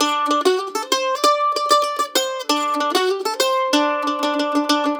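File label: lick, left, blues guitar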